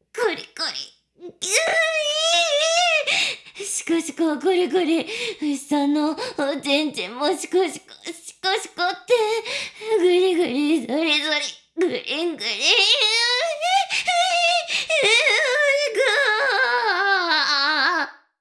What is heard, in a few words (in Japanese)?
しこしこ音声; 牧場戦腺; 少尉